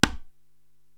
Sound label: Conga Hi-Mute; Korg TR-Rack